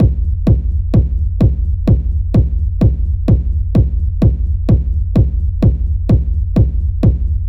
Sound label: Techno Kicks